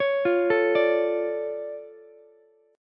D Minor Major 7th, D, Ukulele; DmM7 arpeggiated; JGuitar

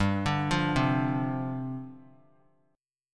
G 7th Suspended 4th G down; G7sus4 arpeggiated

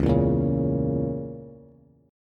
Db Minor 6th Db Bass; Dbm6 strummed; JGuitar

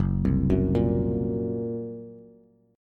Gb Major Flat 5th, down, Bass; arpeggiated; JGuitar

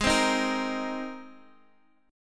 Ab Major Add 9th, down, Guitar, Half Step Down

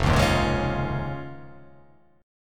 F Minor 7th Sharp 5th

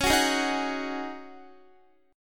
Db Suspended 2nd Suspended 4th Db Guitar; strummed

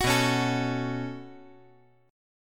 B 7th Suspended 2nd down, chord

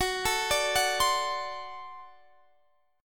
D 7th Gb, guitar

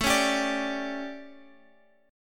A 11th down Guitar Standard, chordshape; strummed

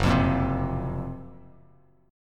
Gb Major Sharp 11th, Bass Standard; strummed; 2,8,8,5; JGuitar

